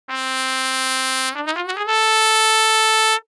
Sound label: half chro, trumpet; samplemodeling